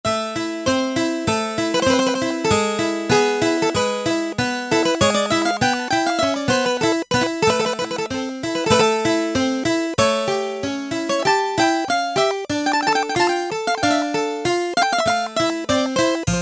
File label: Harpsikord; E-mu Proteus-1; Presets